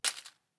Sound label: Shell 39mm leaves; STALKER; IWP